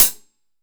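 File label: MIX closed hi-hat, OLD HH; AKAI S-Series CD-ROM Sound Library VOL-3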